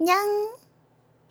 combo break; osu

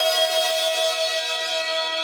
synth main

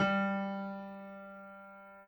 piano

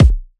small kick

